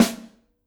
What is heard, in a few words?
Snr, drum